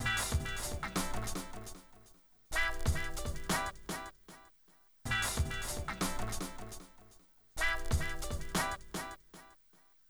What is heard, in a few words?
GTE, Hip Hop and R&B; Best Service ProSamples vol.02; AKAI